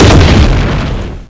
exlpode

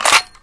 bomb in, weapons

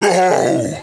pain, armorman

